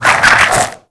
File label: Infested Whip Spin; Warframe; Weapons